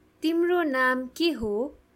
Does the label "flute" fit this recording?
Yes